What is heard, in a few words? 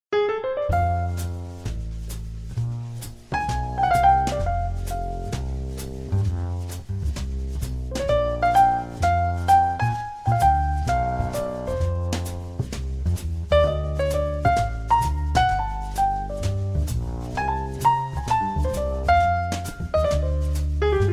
Blues